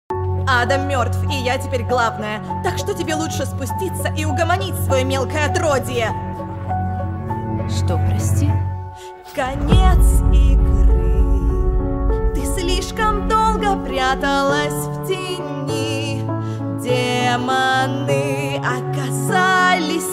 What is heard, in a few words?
rus cover